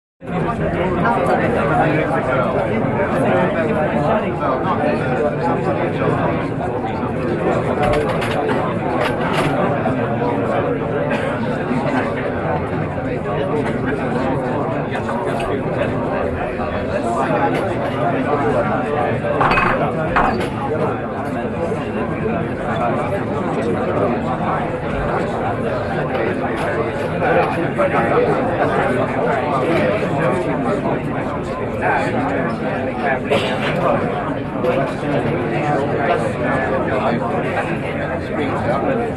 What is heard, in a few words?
Bar Pub Chatter Busy B; bars & discos